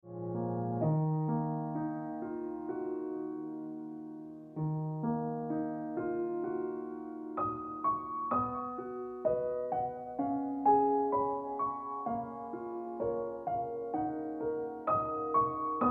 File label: Exception Ringtone Piano Music